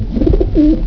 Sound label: bird coo; ANIMALS